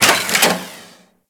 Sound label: Target Arm Start; Venus Open World; Objective Rooms